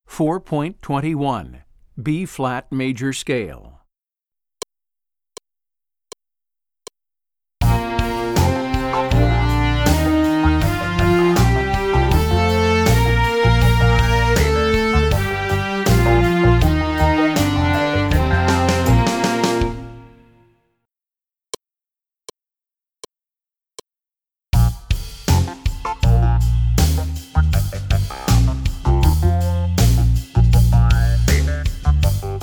Bb Major Scale

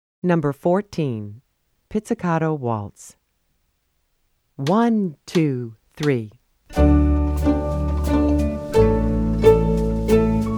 Pizzicato Waltz; New Directions for Strings Book 1

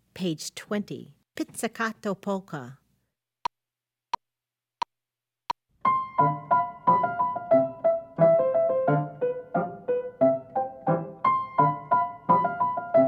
Pizzicato Polka, Practice

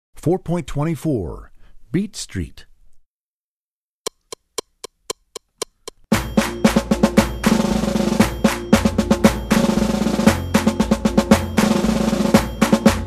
Beat Street, Percussion; Percussion Book 1

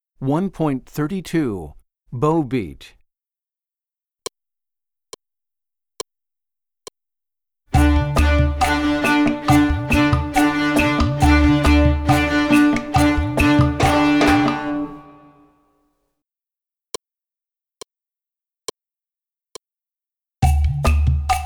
Bow Beat

